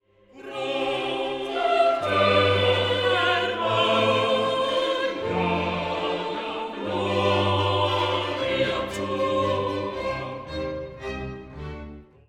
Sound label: Gloria Propter